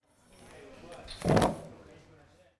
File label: Chair Stand Up